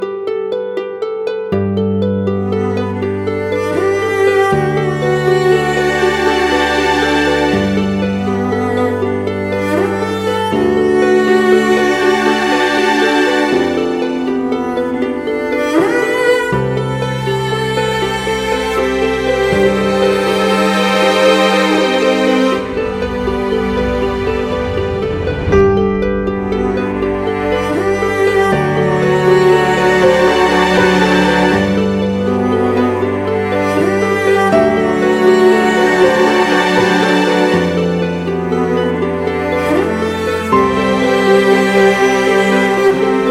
requiem in cello ringtone

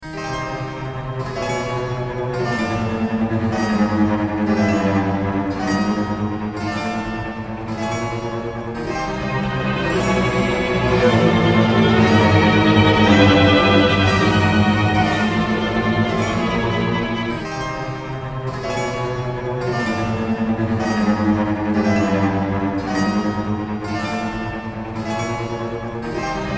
suspenseful music

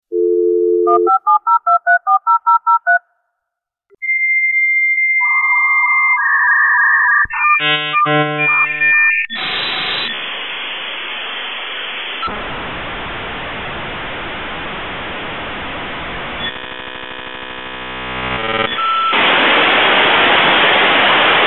dial up sound

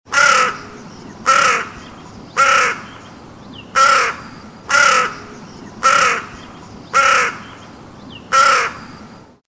Crow ringtone

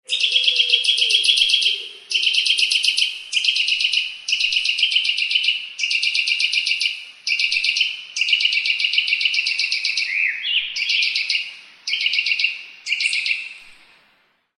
bird ringtone

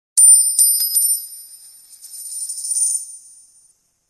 money sms

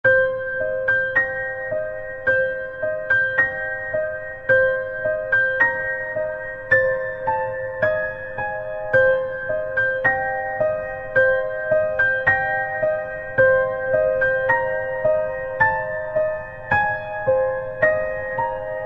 creepy piano ringtone

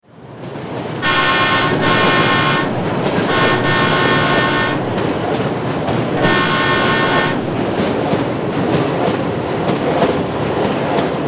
train ringtone

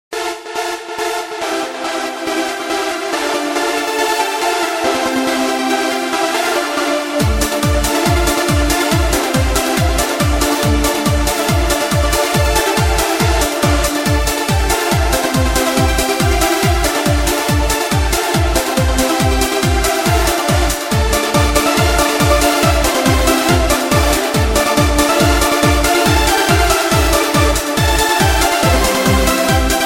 levan polka

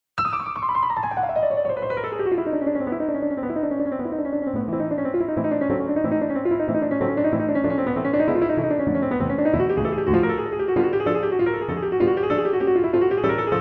flight of the bumblebee piano

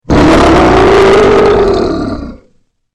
ringtone

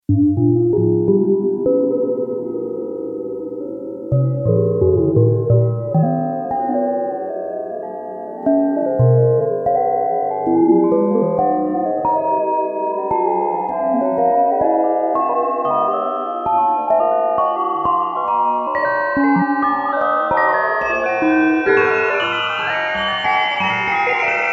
scary chimes

